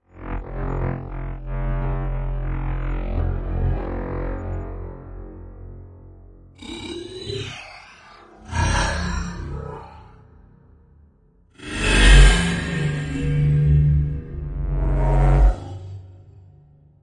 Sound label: Drones; Gothic Storm Music; Advanced Sound Design 2012